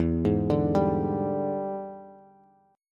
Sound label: E 7th Sharp 9th Bass, chord; E7#9 arpeggiated; JGuitar